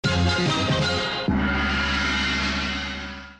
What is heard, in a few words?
message